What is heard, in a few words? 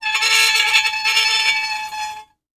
Horror Scary Metal Screech Long